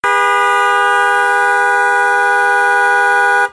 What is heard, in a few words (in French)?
Klaxon